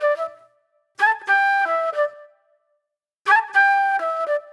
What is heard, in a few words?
Sparse Flute; Sonic Foundry (Sony Creative Software); Manhattan Flutes; Garage Funk